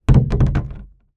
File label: industrial container large plastic empty knock over; zapsplat